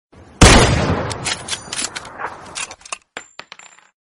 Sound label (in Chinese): 98K枪声; android